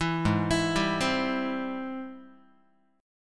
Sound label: Ab Augmented Major 7th Ab Guitar; arpeggiated; 7,4,7,5,4,x; Ab+M7; JGuitar